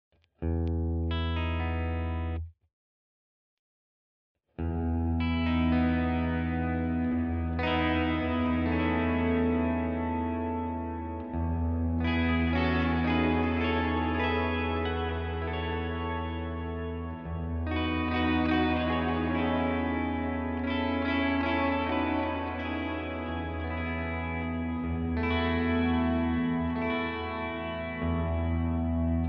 Walrus Fundamental REVERB Plate long

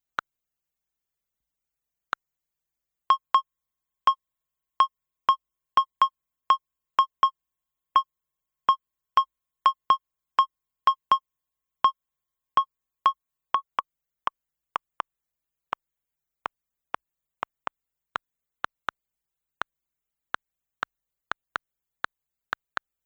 MFB Tanzbaer Claves

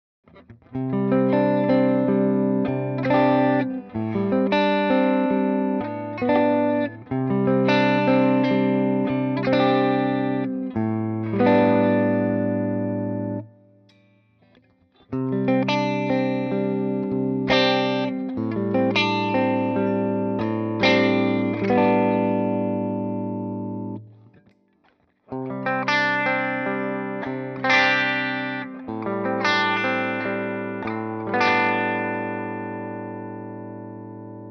Clean

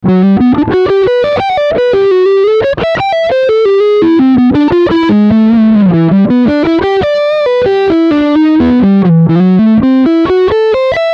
Front Humbucker Lead

